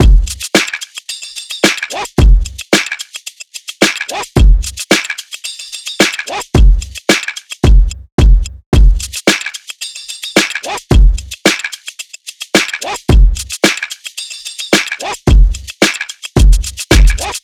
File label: drums 110, Full Drum Loops; Slate; SEISMIC Drum Sample Pack